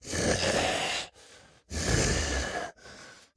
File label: Walk Cycle Burns; The Town